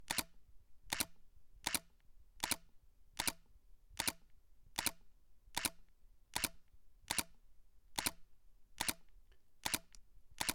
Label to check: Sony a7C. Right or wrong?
Right